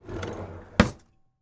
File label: Drawer Close; HauntedBloodlines; SFX Producer